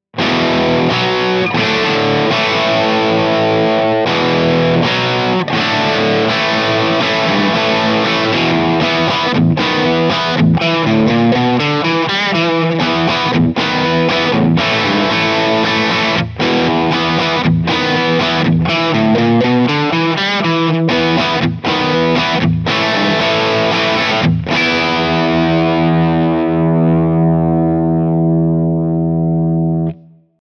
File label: rock chords; jn12-100t-spec